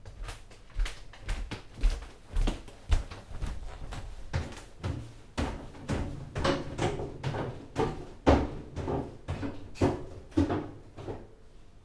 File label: Inside Flat Interieur Appartement